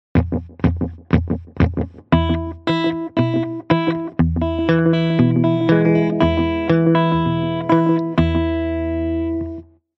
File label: blues